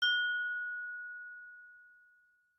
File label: Wine Glass; Samsung Galaxy S4 mini Value Edition